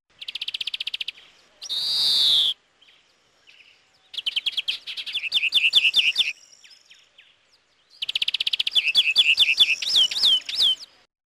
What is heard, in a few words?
european greenfinch